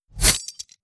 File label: melee sprinting; custom weapon; k31; octane